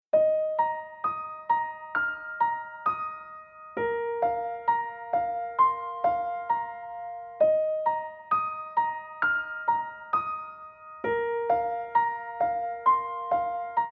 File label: text tone